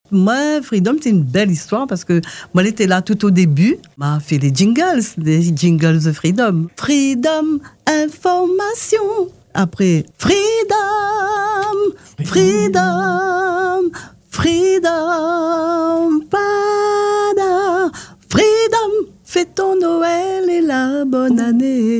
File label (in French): jingles; Free-Dom